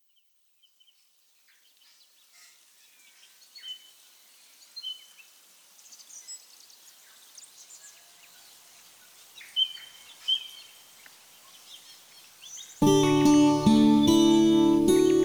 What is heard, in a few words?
ambiance harmony karaoke full mix